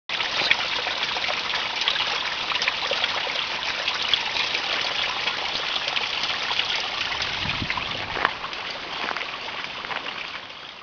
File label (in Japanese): Seseragi